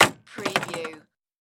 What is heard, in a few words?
Folding Chair Open